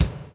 DOWN, DOWN KIT 78

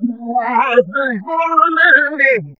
Funky Vocals; USB Soundscan vol.18; AKAI

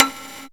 Gated Kit, Percussion; Best Service ProSamples vol.37; Dance Synths

